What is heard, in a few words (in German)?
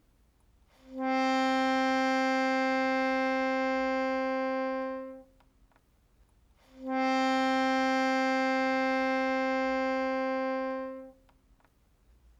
Bando Ton ohne Druck, bandoneon